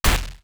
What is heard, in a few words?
hit; burutaru